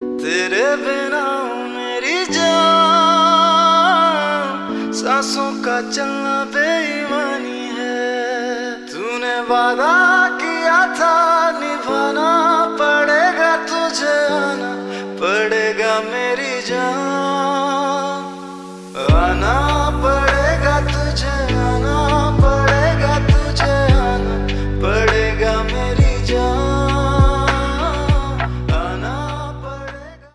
Song Lofi

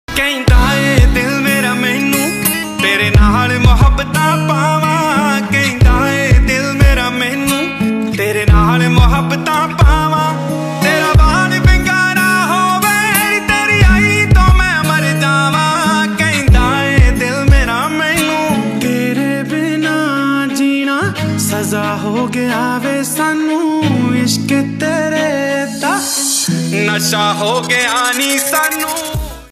Ringtone

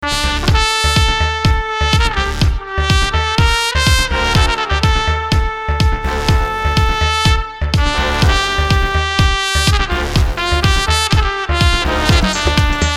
Theme Background Music

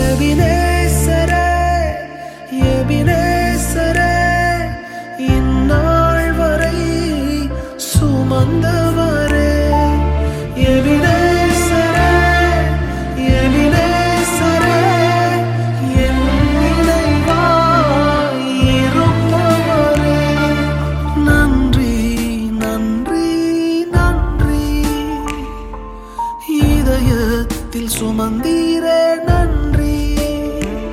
Telugu Love Song

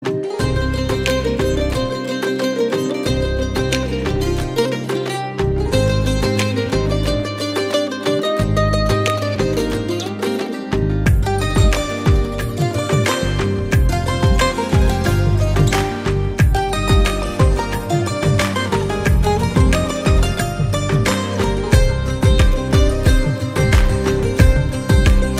Instrumental

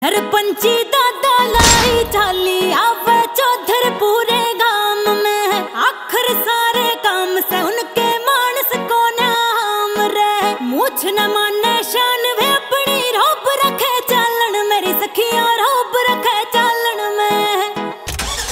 Haryanvi Song

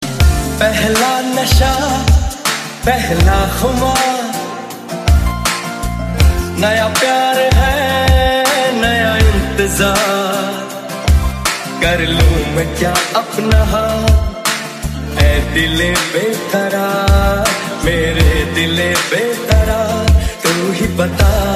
Chill Mix

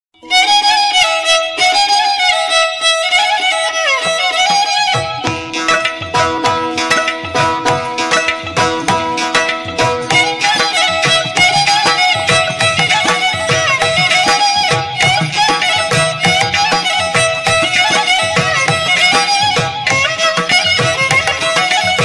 Tamil Bgm

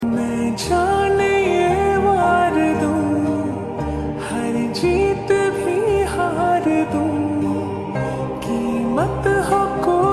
Lofi, ringtone

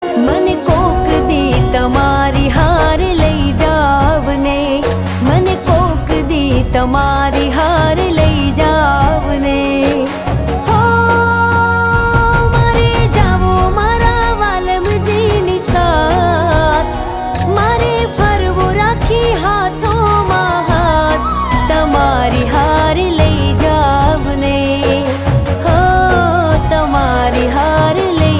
Song Gujarati

